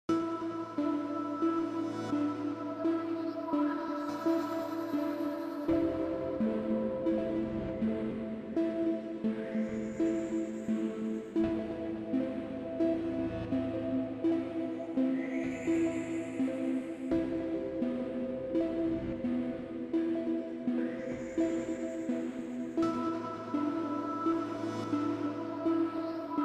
Melancholic Haze

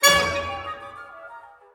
Trumpet Call

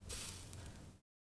Extinguishing Cigarette

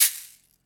Shaker

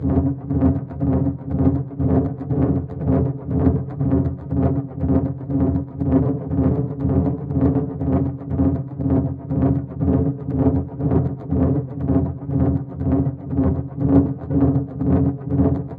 Tension Synth Loop

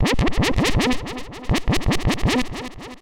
Live Wonk Synth

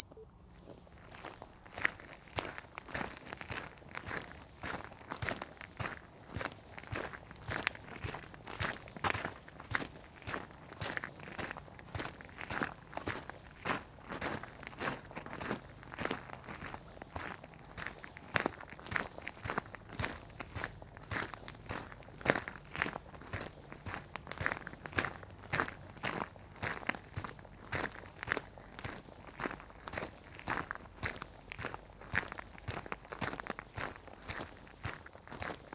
Walking On Gravel

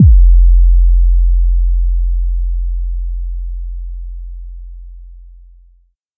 Bass blast

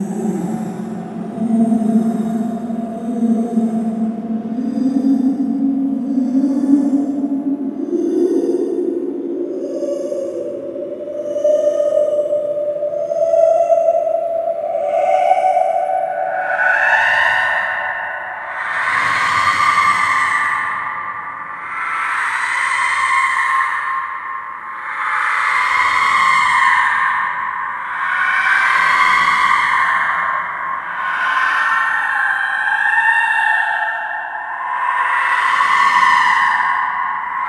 Demonic Screeching

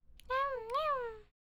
Cute Creature

